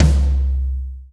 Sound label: NATURAL, NATURAL KIT; Spectrasonics; Burning Grooves CD2